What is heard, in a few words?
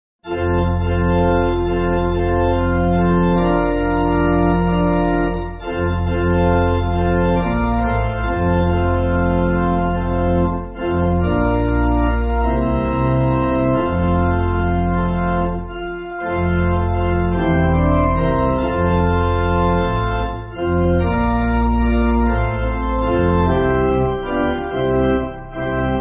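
Pipe Organ, Backing Files for Churches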